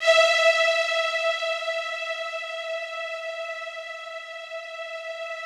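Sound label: Yamaha VP-1, rough strings E4; 90 s Sample CDs; Music Store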